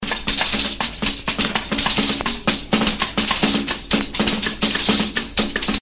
percusion cueca

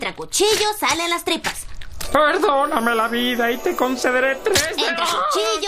entra cuchillo

